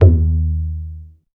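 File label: percussion G1, Tabla + Sitar, MIRAGE; Best Service ProSamples vol.55 - Retro Sampler; AKAI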